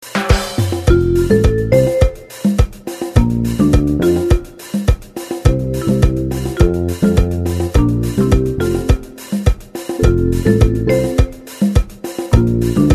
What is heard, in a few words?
acc only